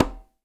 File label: Conga Hi Muted; One Shots; Drums; Ableton Live 12 Lite; Core Library